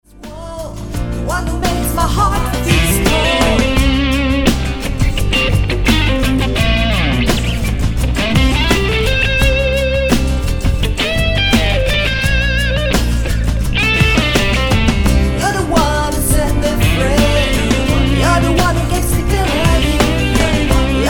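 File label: electric guitar pop rock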